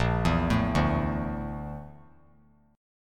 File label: A sharp Major 7th Flat 5th, chord; A#M7b5; arpeggiated; JGuitar